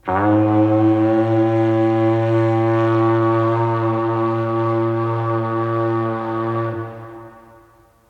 Trumpet Grave

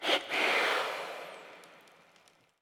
Double Breath

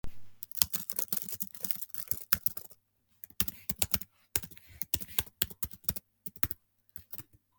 Typing

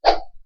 Sword Slash

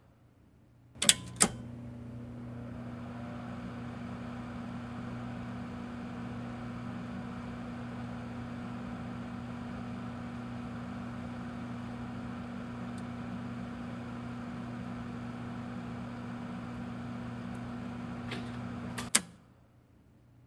Overhead Projector

